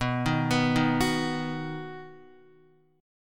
B-Major B down Guitar; B arpeggiated; 4F,4A,5C,5G,5C,6E; chord shape; JGuitar

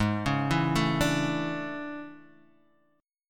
A Major 11th; chord

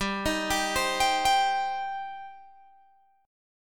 G Suspended 4th Sharp 5th G, down, Guitar, Open G

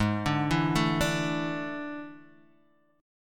A Major 7th Suspended 4th G sharp

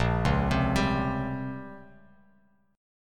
A sharp Minor 6th Add 9th, Bass Standard; arpeggiated; 6,4,10,12

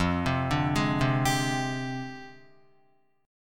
F Minor 6th Add 9th, Guitar; JGuitar

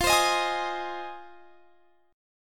F Minor 7th F; strummed

